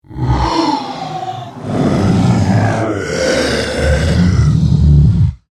Creature Monster, Horror, Creature, Monster, Werewolf, Voice, Wheeze, Grunt, Snarl; BlastWave